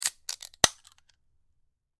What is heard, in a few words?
Can Beer Open Slow; foley; Objects; Blastwave